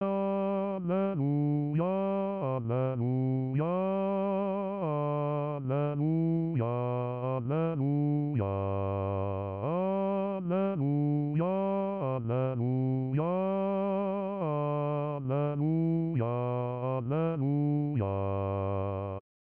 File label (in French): basse